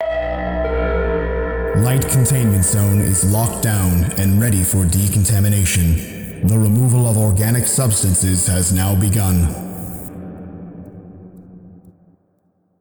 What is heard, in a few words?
Decont begun Bells